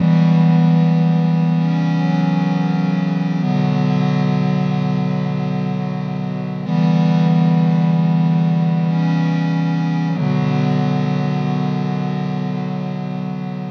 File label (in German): Sampler 70bpm B